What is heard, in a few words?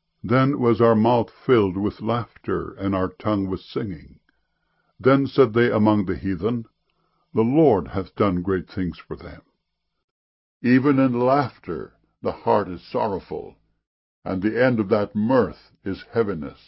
laughter